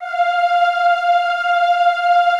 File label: F4, Classical Choir; Best Service; Extended Classical Choir